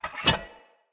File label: Sphere Polearm Unequip B, Weapons Player